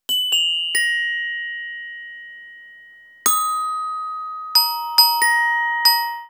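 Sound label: Glockenspiel; Sonic Foundry; Cinematic Funk Urban Tone Poems; Master Zorn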